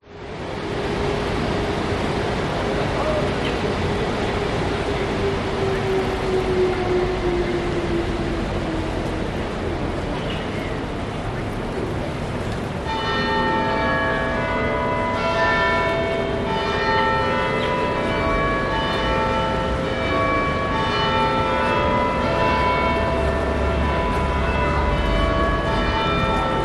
public places city street town hall bell strike 11am traffic people; sydney; australia